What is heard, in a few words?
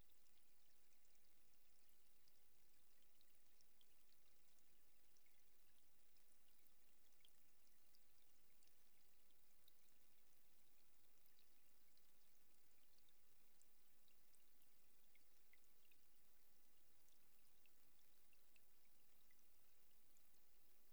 Rain underwater